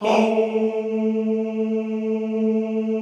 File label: HOUUU, Classical Choir